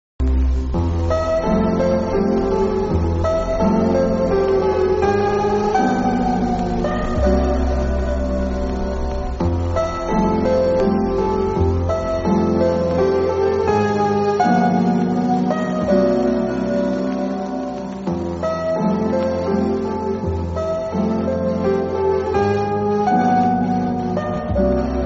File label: Spooky Music